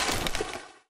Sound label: item pickup